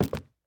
wood step, Footsteps; Civ, Effects, Wood

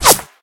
Bullet Whip; Guns Misc; civ13; civ14; Weapons

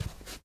carpet, Footsteps

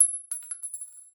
casing drop; civ13; civ14; Weapons; Guns

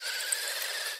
rc car, vehicles; civ14

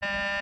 custom deny; Machines

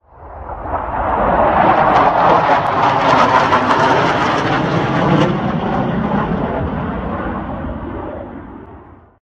su25 left-right, Aircraft; civ13; civ14; Vehicles

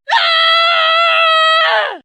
female generic warcry

